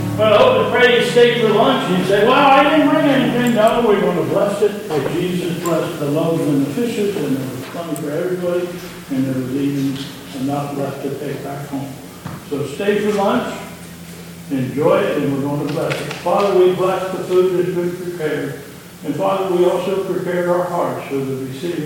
Closing words and chorus

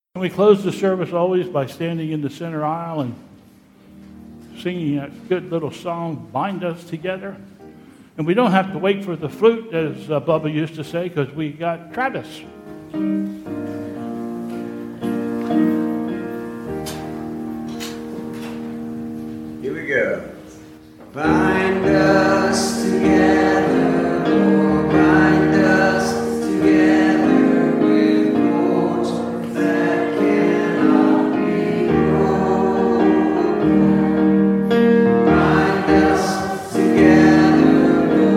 Closing Chorus and Benediction; Epiphany Sunday; Bethel's Sanctuary

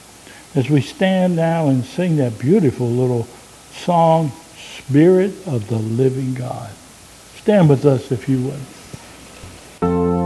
Closing Hymn

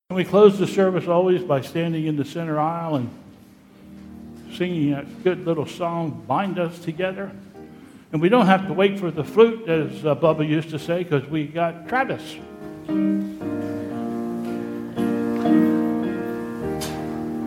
Closing Chorus

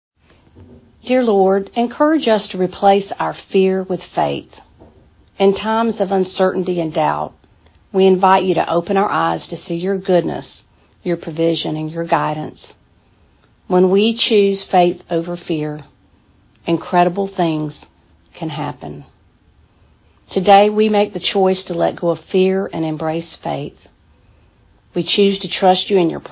Benediction & Closing Chorus

